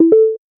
Blocky Sound

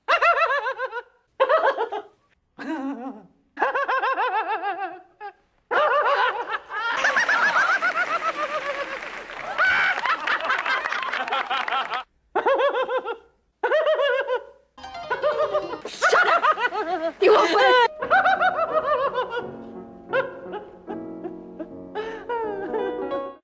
Laughter

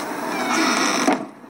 Door

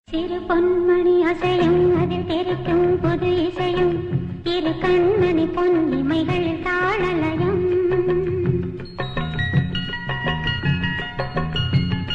ringtone

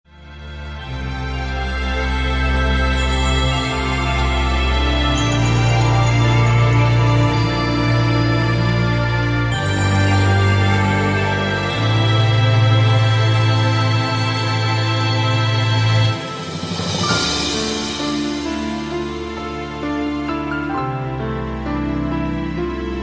piano ending

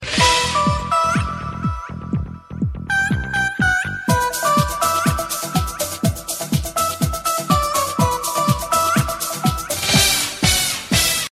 chineese music, ringtones electronic

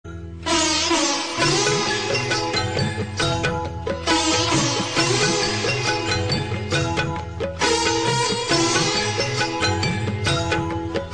indian classical

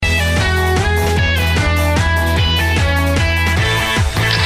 tune rock

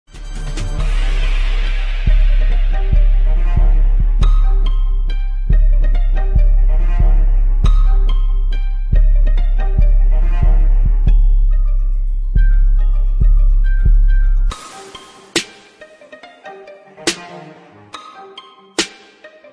instrumental

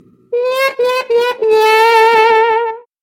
trumpet

